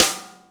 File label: Snare, Ensoniq ASR-X